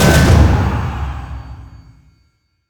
Sound Effect Get Artifacts

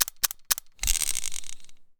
weapon reload revolver

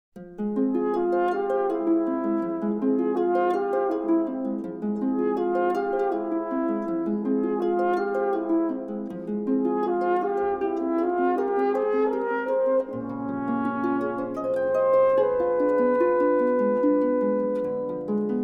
Fairy's Horn; Dragon Quest V; Symphonic Suite Dragon Quest

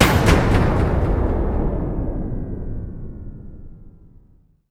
Music Huge Wave

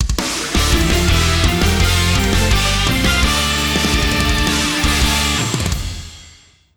Battle Jingle; Saint Seiya Soldiers' Soul

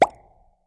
Candymachine; Candy Crush Soda

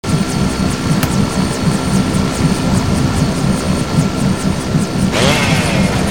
Umbrella; Infiltrating The Airship

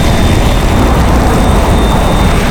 Nitro Loop; Nicktoons Nitro; arcade

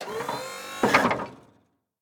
hydraulic short; Plants vs. Zombies

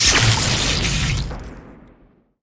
Box Collapse

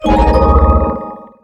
SFX Success

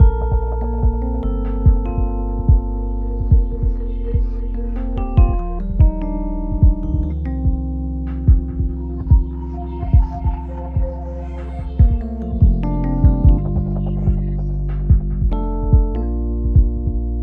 music cheerful-bright loop

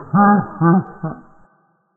Laugh

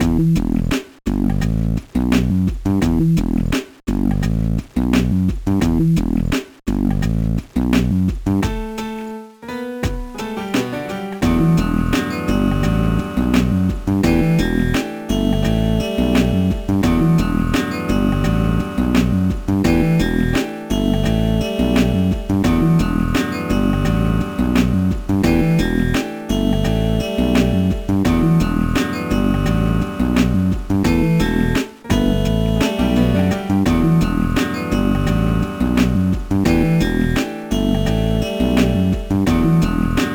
BGM; Gachinko Pro Yakyuu 2002; GBA

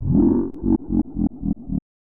Laughs; Ganon Laughs; bs zelda; snes

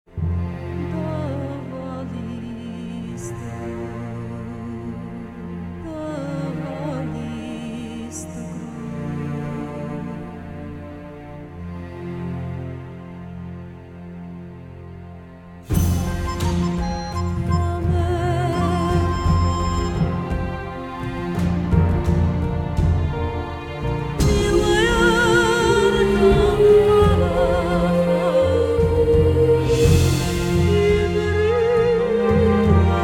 Elves Amb; Disciples III Reincarnation

